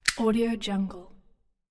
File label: mechanical power plug connect